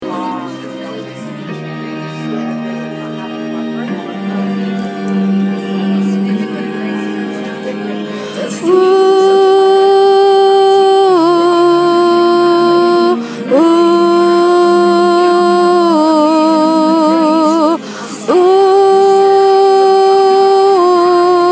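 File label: soprano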